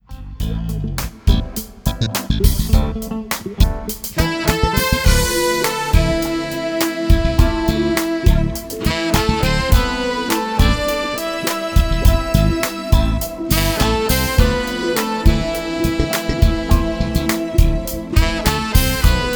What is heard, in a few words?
Horns Included Lead Guitar Included Key Of A Minor